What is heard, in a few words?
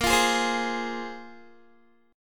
A sharp 7th Suspended 2nd, Guitar; A#7sus2; strummed; 17,20,20,17,20,x